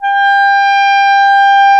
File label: G 4, REED; AKAI S-Series CD-ROM Sound Library VOL-8